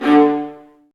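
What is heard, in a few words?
Marcato, Strings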